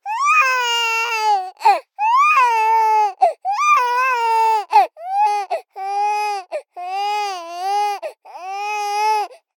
BABIES CRY Boy 3 months Uncomfortable; Baby Boom

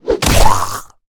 zombie whit; DeadFright

